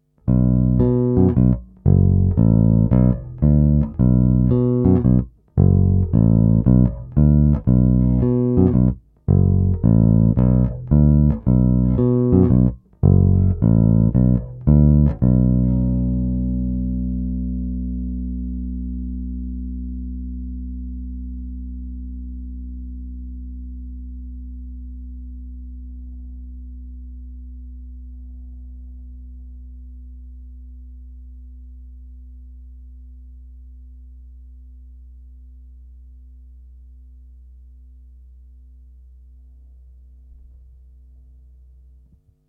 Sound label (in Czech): Krkový snímač, basa; Vlček Basses Fineso 5; Hráno vždy nad aktivním snímačem